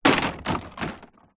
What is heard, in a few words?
household plant pot large plastic impact ground